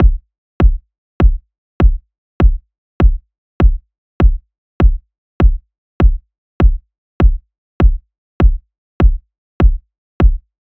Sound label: Kick 100